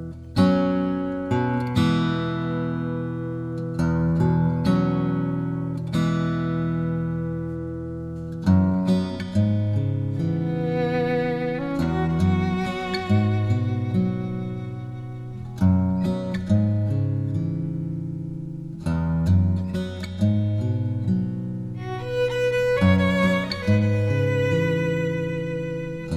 sad song

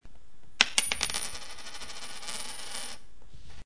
Coin

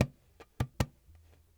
Dumbek

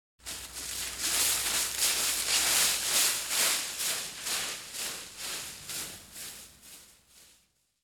one person walking, Grass and Dirt; Butterfinger Video Sound Effects

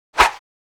swish single; Asian Show Sound Effects

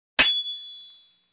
sword hit single; fights bodyfalls; Asian Show Sound Effects

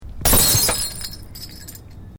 glass

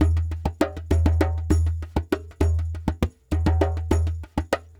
Lg Dumbek

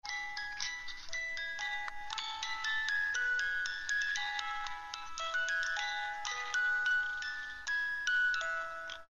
dada sing

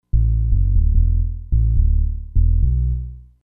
bass

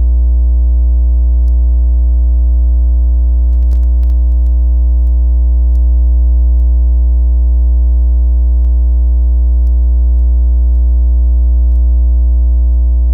60Hz Hum

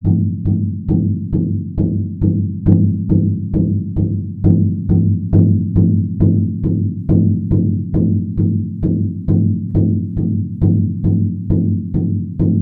Big drum; Sneak Up (Gittantmeah)

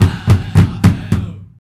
Group drum hits

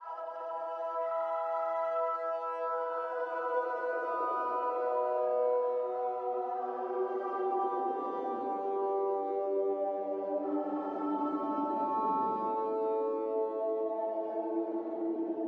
sad pad

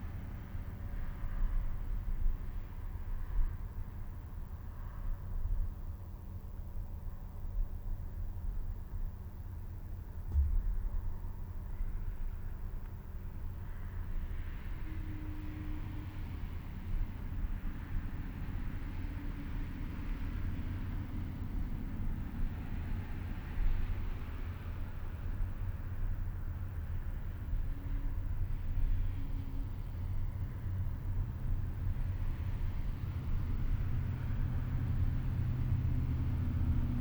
train; Ambisonics

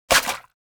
impact juicy; fights bodyfalls; Asian Show Sound Effects

